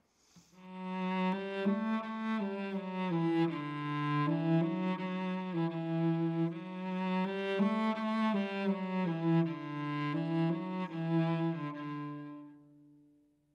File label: Cello